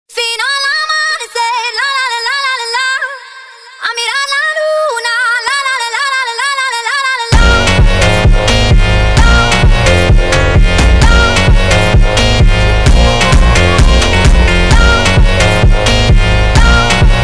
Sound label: funk